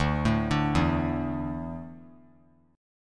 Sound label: D Suspended 2nd D down; arpeggiated